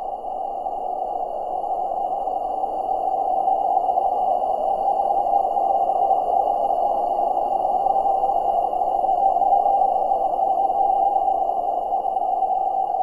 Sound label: ambience wind cave; everness